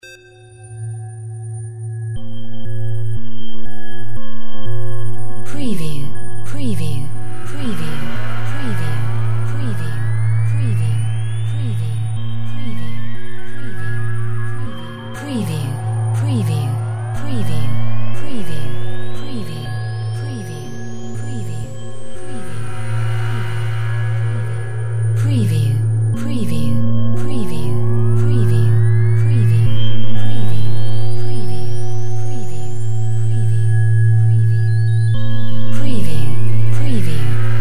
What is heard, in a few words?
drone matrix random fx